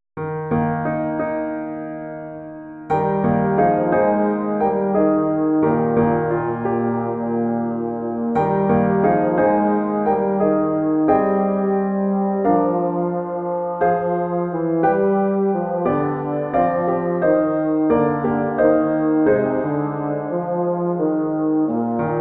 solo

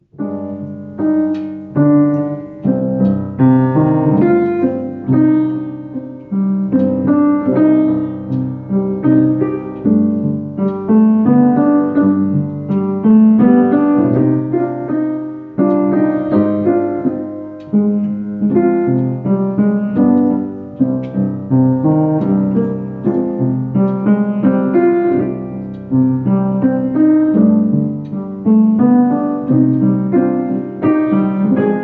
accompaniment

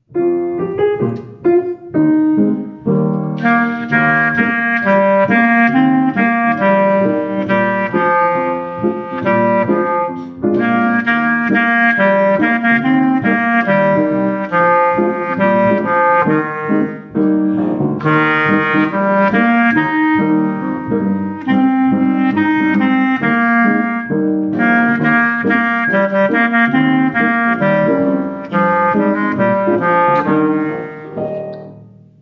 with Solo

